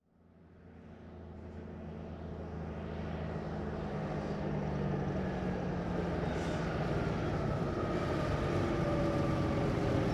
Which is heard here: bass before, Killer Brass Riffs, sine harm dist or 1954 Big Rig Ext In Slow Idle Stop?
1954 Big Rig Ext In Slow Idle Stop